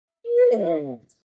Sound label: yawn